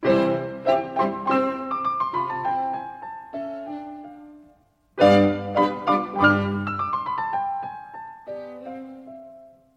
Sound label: Piano Trio in C Allegro; Haydn